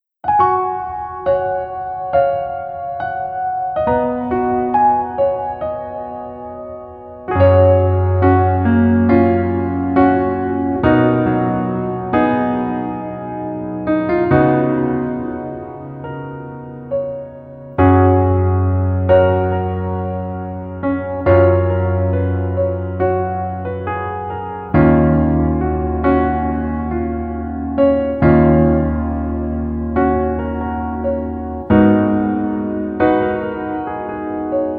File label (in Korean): Piano ver 멜로디MR 여자키